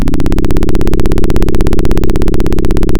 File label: Sound of a plane taking off